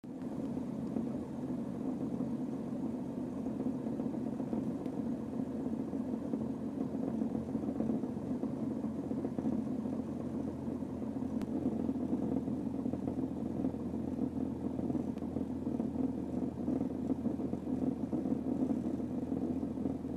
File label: noise transmission jeep transmission noise